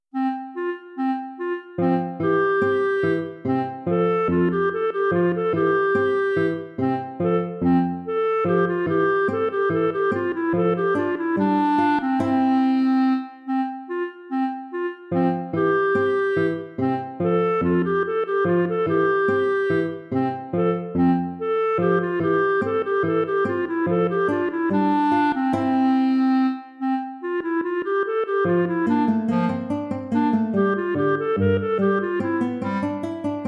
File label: gavotte; corelli arcangelo